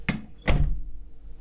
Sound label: door close; ドア閉める; ドア閉めた！(立体)